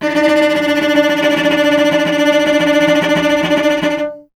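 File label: strings cello D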